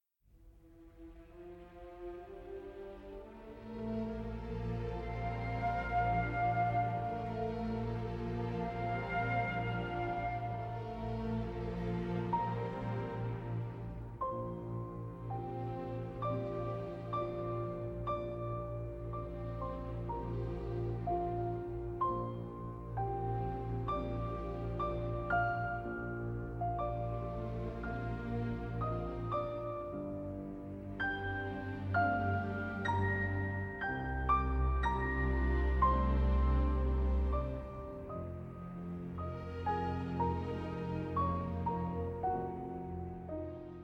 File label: Piano Concerto No. 5 in G Major; Op. 55; IV. Larghetto